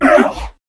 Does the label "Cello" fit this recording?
No